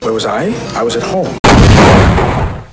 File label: exploding